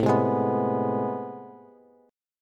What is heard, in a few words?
A Minor Major 9th, Bass; AmM9 strummed; 17,15,18,16